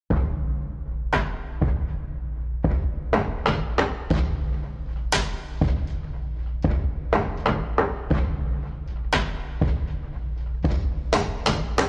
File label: Drums Only